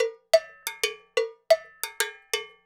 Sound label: Cow Bell, Latin Percussion